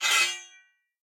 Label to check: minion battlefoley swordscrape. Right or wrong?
Right